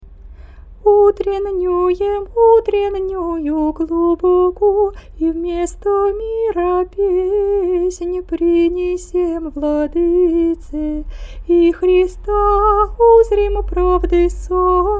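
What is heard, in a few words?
easter canon byzantine hirmos faster, singing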